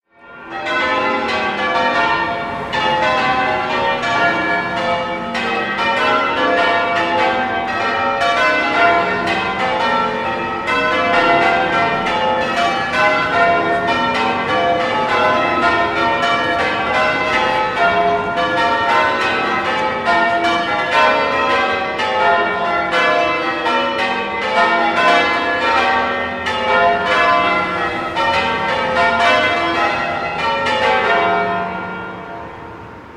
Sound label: church bells; St Giles, Reading